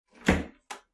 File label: door wood open